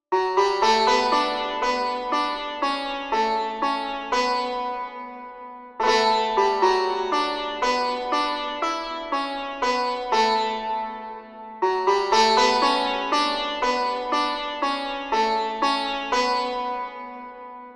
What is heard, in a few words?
santoor